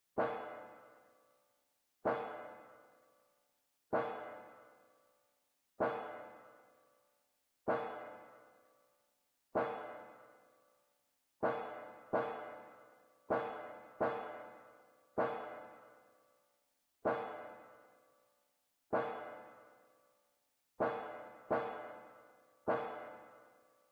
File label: PERC